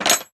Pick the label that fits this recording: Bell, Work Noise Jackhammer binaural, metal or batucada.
metal